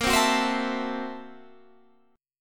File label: Gb 7th Sharp 9th Flat 5th Bb, guitar